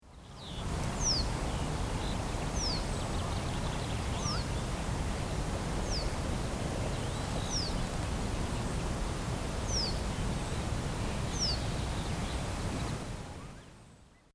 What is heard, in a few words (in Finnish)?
pajusirkku, linnut